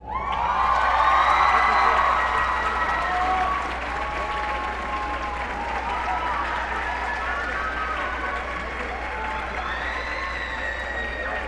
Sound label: Ovation